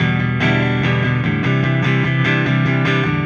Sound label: Silos Clean Strum Guitar, Indie Rock